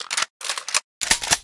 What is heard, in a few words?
Gun Reload Weapon, Weapons Foley; SFX Kit